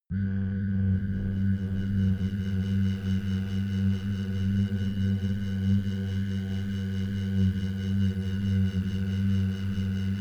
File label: sci fi